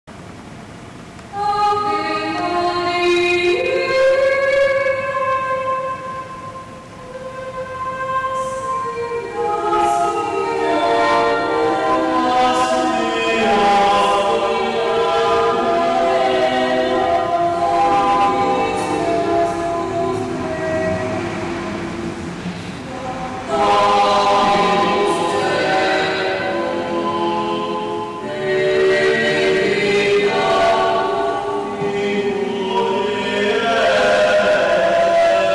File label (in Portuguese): quarteto vocal ave maria